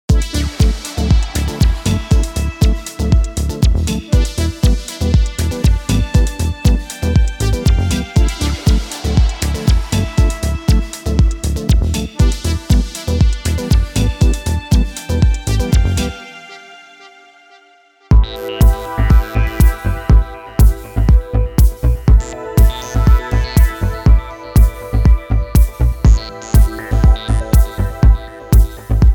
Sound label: deep house, with tal noisemaker; edmsoundware; soundpack